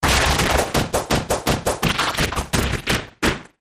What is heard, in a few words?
Whippy Grit Groove